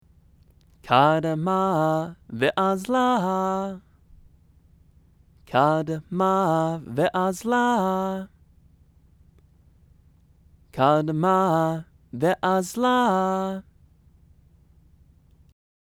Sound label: megillah; trope